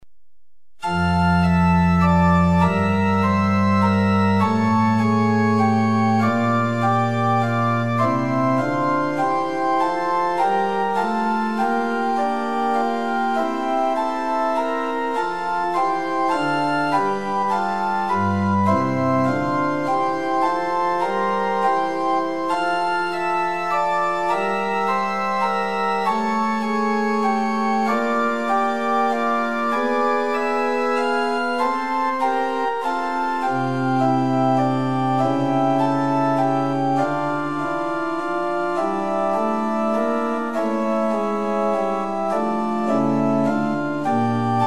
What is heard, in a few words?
Liturgical Organ Pitch 440 Temperament Equal; Prinz 8 4; hymn